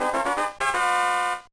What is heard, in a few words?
Power Up Fanfare; Super Mario Advance 1; Super Mario Brothers 2